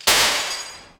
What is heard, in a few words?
glass